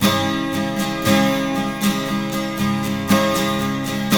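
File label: 12 string minor D, guitars; Spectrasonics; Hans Zimmer Guitars VOL-1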